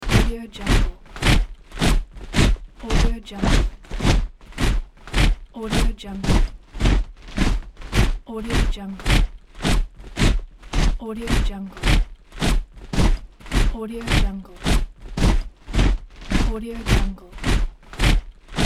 soldiers marching; juicycorp

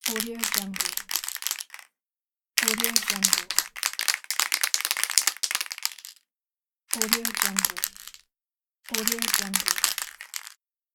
spray paint can shaking

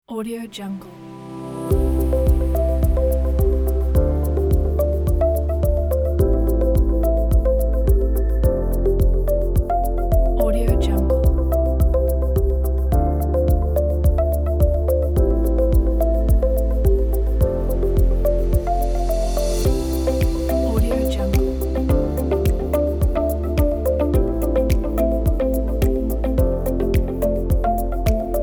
real estate kit; black-boxs

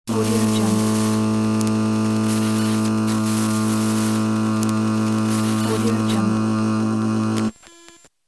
neon buzz radio

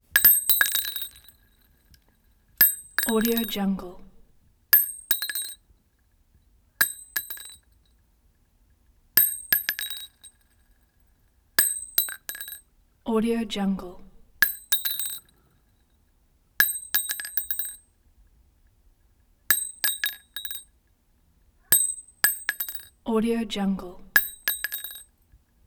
round metal drop onto linoleum floor; prosoundfx

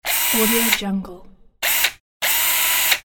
electric drill

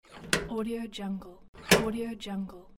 mail box; tibasfx

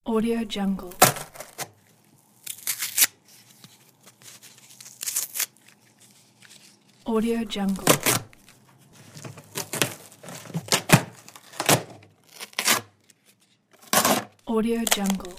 tearing cardboard or box

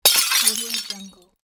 glass breaking

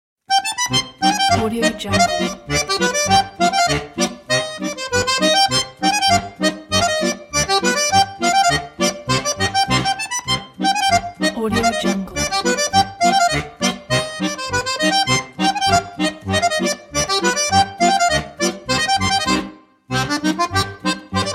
russian accordion solo

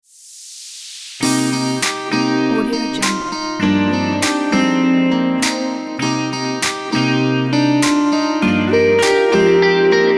lounge guitar; pianodays

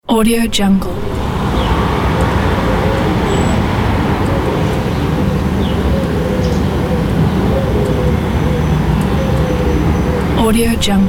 morning street ambience

